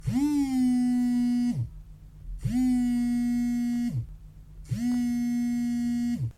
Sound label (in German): vibration anruf, Vibrationsmotoren im Smartphone; samsung galaxy a51; So groß ist der Unterschied zwischen Vibrationsmotoren im Smartphone